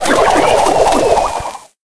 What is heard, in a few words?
gadget; dynamike; Brawl Stars